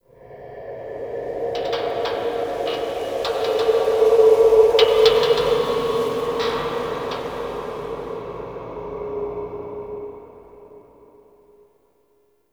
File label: Zariman Park Wind; Warframe; The New War; Ambience